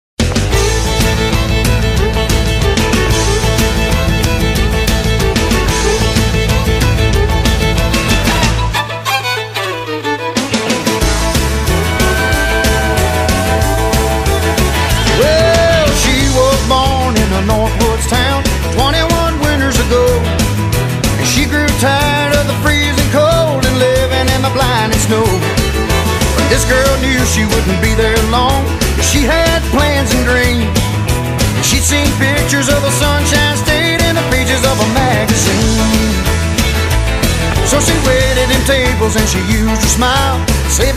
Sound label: Clickety Clack